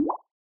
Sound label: bubble; misskey; syuilo